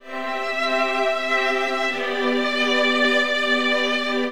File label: strings, Sad